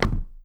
land; nzp; player

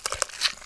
web spin; weapons

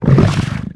role3 die; player

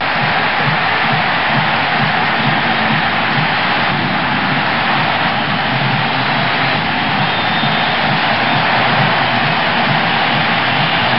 crowds; q3rally

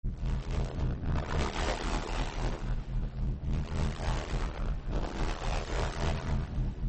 ambient; ambience

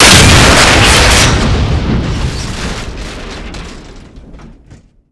exp metal armor veh, explosions; cod4-sdk

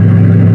engine; q3rally; sidepipe

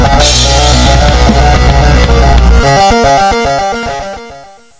round end; nzp; psp; nzportable

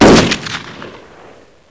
shoot, ptrs; nzp

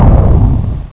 hydro, doors; ParoxysmII

